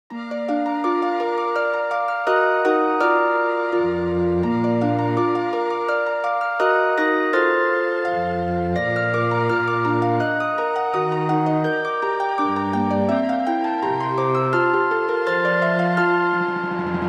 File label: Dialogue for Piano Harp and Orch.